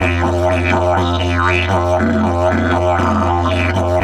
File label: 120, Didgeridoo